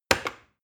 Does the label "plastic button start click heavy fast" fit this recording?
Yes